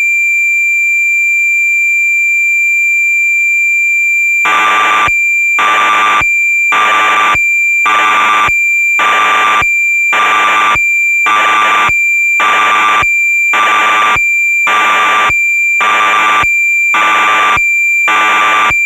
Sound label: Yamaha DX9 factory presets efx; Synthesizer Factory Patches